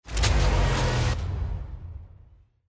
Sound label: transition